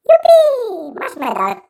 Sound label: congrats; literki dzwieki